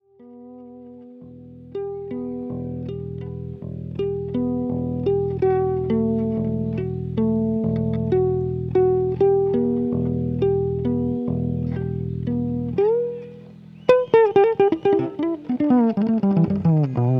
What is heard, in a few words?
Bass Improvisation